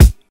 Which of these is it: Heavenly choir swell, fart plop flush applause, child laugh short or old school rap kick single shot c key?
old school rap kick single shot c key